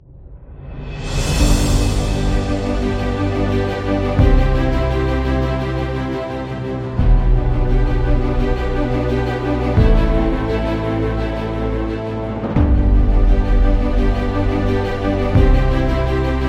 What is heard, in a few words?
Epic Award